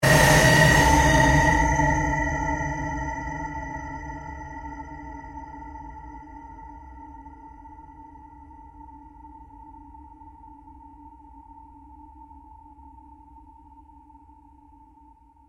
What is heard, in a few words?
Created Drone Fade Long, Drone Sound Effects; sound building blocks created through manipulation of raw material